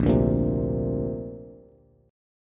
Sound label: Bb Major Add 9th, Bass; strummed; JGuitar